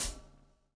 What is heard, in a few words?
SLICK, Backbeat